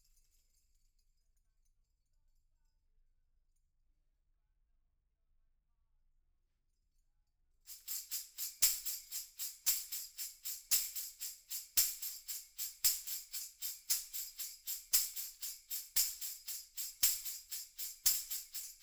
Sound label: PERC OH